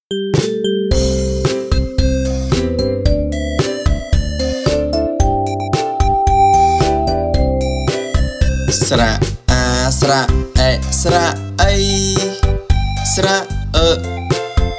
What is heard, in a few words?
Khmer Vowel Song; បទចម្រៀងស្រះខ្មែរ